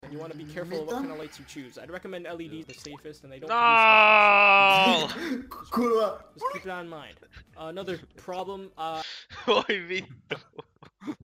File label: blop